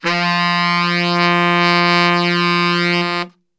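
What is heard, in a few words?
F 1X SAX STR, saxophone; Best Service; Brass Super Section; SAX STRGHT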